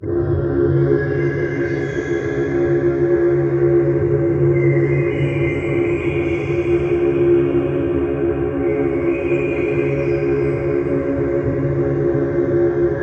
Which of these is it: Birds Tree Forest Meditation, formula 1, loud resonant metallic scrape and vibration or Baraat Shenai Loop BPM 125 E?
Birds Tree Forest Meditation